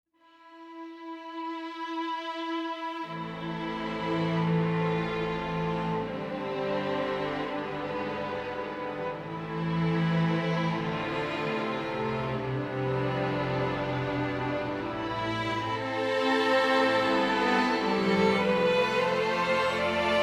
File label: Strings, live strings